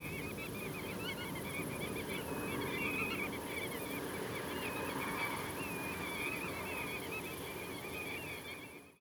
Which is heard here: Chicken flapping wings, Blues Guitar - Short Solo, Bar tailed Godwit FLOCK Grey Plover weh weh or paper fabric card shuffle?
Bar tailed Godwit FLOCK Grey Plover weh weh